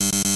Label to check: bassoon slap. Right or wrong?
Wrong